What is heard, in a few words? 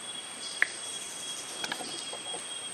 Fiery-topaz, پرنده زبرجدی آتشین; صدای پرنده زبرجدی آتشین